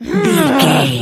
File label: voice female growl frustrated